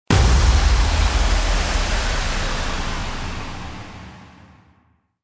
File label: IMPACT